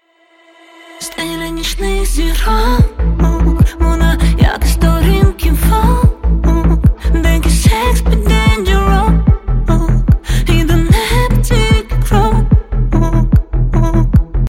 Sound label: indie rock